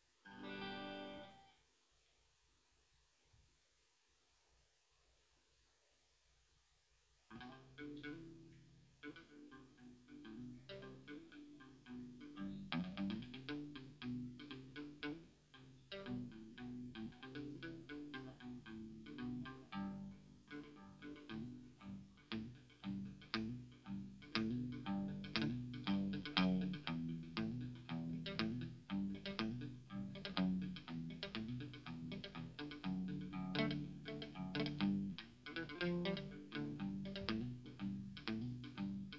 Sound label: Guitar Soundcheck